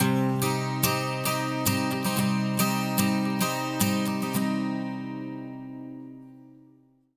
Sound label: 110 12STR, Acoustic Guitar Loops; USB Soundscan vol.04 - Electric & Acoustic Guitar Loops; AKAI